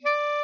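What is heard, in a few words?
clarinet note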